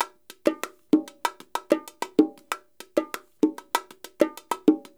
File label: LOOPS, NYC Percussionworks; Sampleheads; 90 s Sample CDs